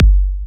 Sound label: KICK, DRUM HITS